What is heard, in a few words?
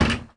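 front door close hit; volvo 9900; Freyfurt Beta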